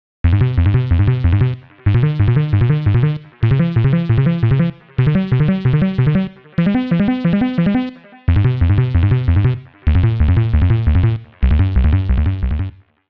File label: white pattern seq